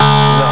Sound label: wrong; france